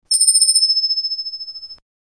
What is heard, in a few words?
coin; mario